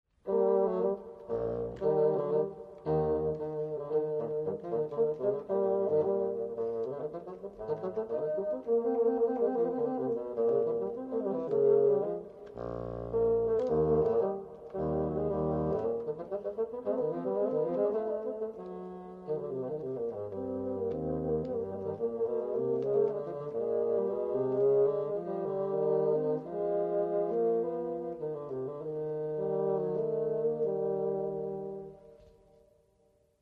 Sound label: duet